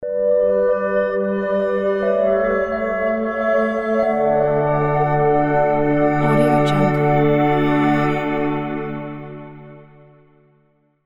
glass reflection logo

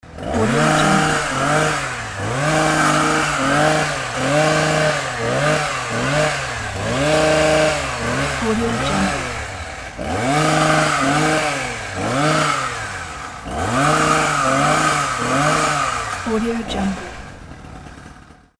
grass trimmer

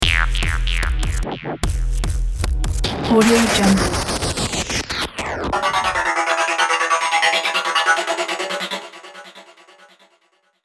slick glitch logo

